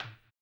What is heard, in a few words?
HAMBONE; ILIO; Vocal Planet; Blues & Soul